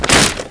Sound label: dark frame damage